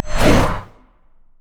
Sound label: thundering blow; LoL; jayce